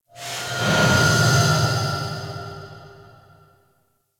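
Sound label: Large Cargo Room Distant Ambience; Warframe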